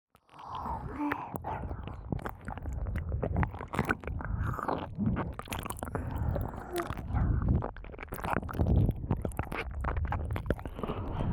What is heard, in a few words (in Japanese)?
両耳舐め; ASMR